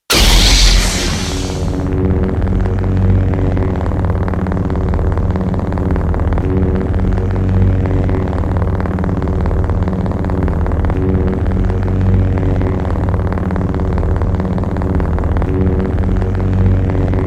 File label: Lightsaber; Baylan Skoll